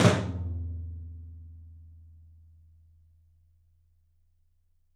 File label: OPEN TOM; 3D Sound; 3D Drums